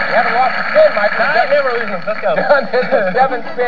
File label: lose spin